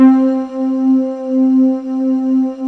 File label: HORNS C4